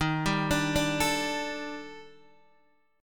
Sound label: Eb Major Sharp 11th, down, Guitar, DADGAD